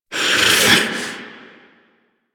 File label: Growl; Good End; Eleanor; Warframe; Hive Queen; Cinematics